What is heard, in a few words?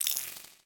Glitchy-Glass; viewfinder